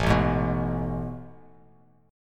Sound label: A Major 7th Suspended 2nd, Bass Standard; AM7sus2 strummed; 5,0,6,4; JGuitar